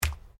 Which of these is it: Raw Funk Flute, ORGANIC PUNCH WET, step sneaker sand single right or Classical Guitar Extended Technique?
ORGANIC PUNCH WET